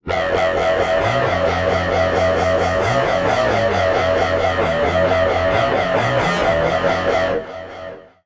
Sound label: Synth Mono; TSMtoolbox